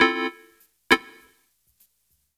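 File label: KEY1 Right, FUNK; USB Soundscan vol.47; AKAI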